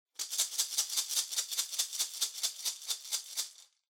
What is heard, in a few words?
Maracas Roll